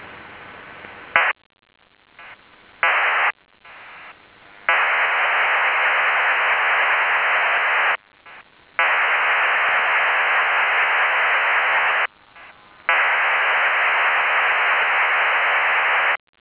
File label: PSK2400 ADAP